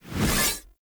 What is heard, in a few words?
Two Handed Axe Whoosh Heavy; Weapons; Enemy; Axes; Sinecure Two Handed Axe